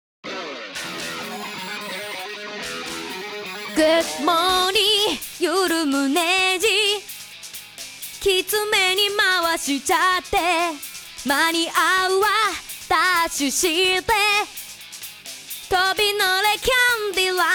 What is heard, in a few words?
Acapella; Gintama